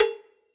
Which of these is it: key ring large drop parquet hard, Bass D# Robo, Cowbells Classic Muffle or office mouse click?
Cowbells Classic Muffle